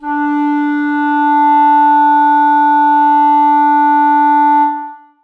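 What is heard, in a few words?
clarinets sustain d4; Sonatina Symphonic Orchestra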